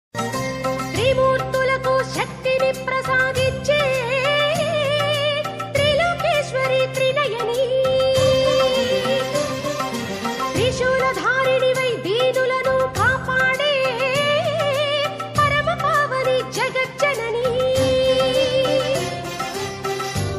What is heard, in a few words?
bhakti mobile ringtone; durga maa; durga devi ringtone